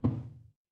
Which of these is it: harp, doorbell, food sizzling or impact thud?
impact thud